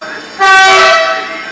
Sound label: klaxon